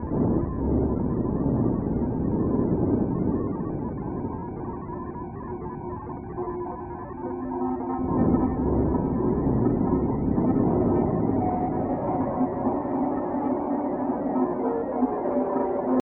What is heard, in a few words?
Danger Tonal; Insanely Twisted Shadow Planet